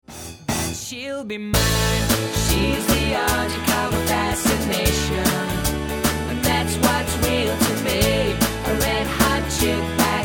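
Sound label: pop song drums + keyboard Guitar + voice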